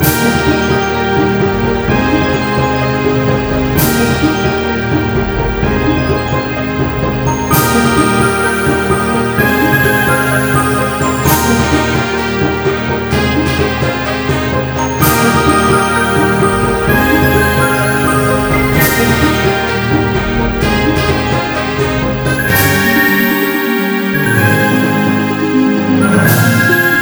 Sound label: Stage BGM; hichi and friends